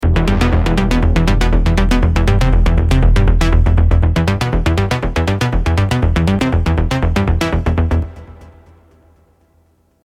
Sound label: Bassline Timeless (Em 120bpm); MIDI; Basslines; Unison MIDI Starter Pack